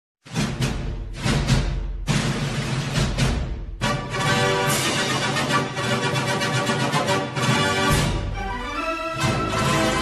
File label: funk slowed